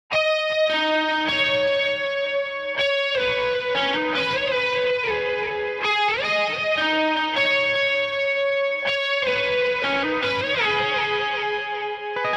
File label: b gtr